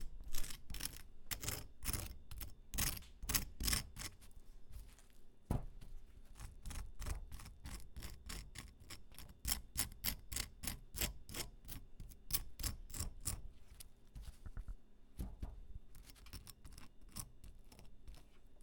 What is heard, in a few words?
Buttering Eggos